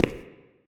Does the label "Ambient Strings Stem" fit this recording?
No